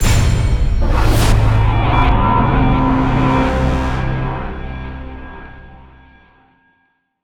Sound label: Duel Lose